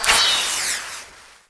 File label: flame arrow; AoM-ARTofMyth